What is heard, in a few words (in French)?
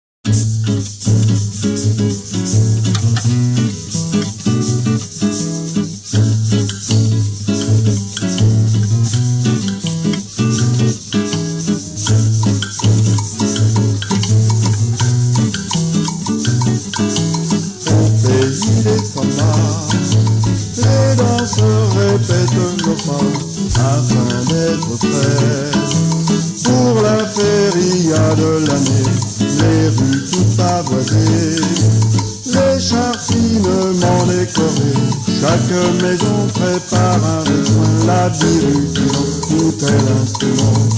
Carnaval samba